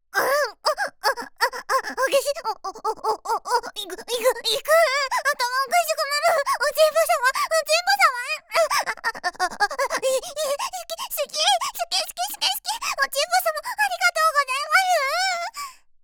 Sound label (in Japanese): あぁん♥あっ♥あっ♥あっ♥